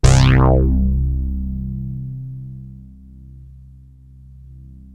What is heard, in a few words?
BASSFORM, MORPHEUS